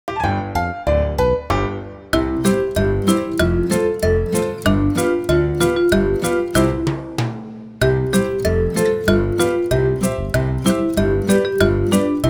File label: Instrumental